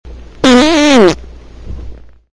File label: fart